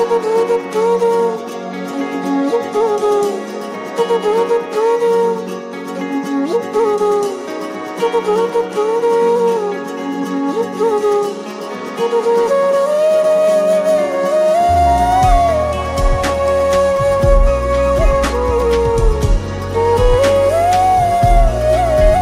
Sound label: Chilaka Ringtone